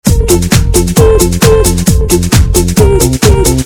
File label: Loop